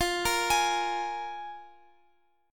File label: F Minor Double Flat 5th, F, Guitar, Open F; Fmbb5; arpeggiated; x,x,x,12,13,15